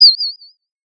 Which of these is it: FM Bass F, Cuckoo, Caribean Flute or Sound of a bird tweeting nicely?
Sound of a bird tweeting nicely